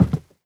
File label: step light, footsteps cardboard